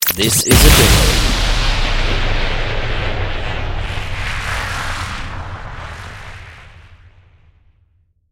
Beep Crash long tail

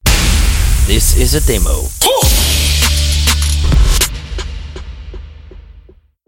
Hip Hop RnB with bar of beat

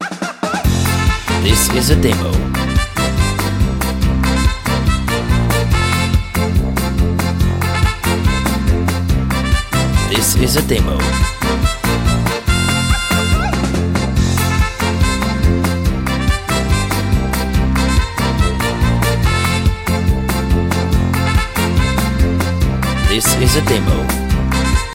Funny Folk Mexico Latin Dance 142 bpm; MUSICBED

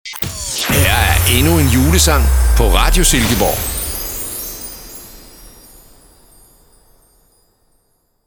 Xmas ID

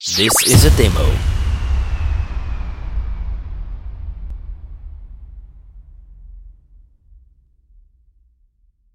Swoosh Boom

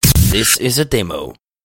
White Noise

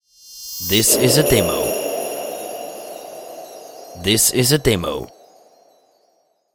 Xmas Soft santa flys by in the night

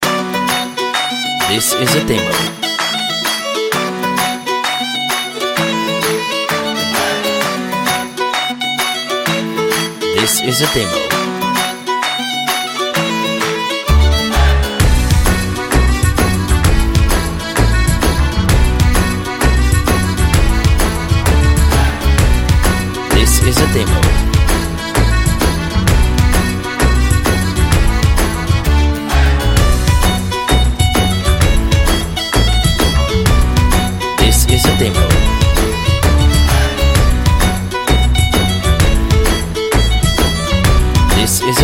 Folk Irish Party at the Irish Pub 130 bpm

